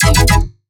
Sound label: Melodic Bass E 150; One-Shots; Bass Hits (Melodic); Ghosthack; Color Bass Essentials